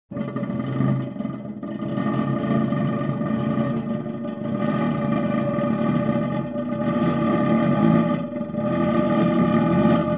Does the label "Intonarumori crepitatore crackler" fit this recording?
Yes